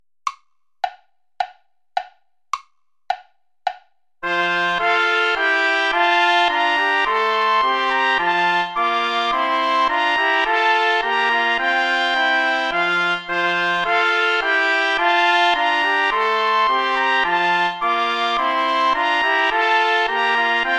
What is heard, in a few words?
play along without trumpet; bach johann sebastian; was gott tut das ist wohlgetan